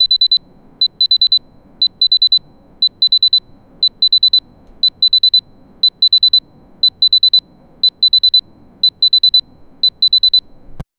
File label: the repetitive beeping of a heart monitor in a hospital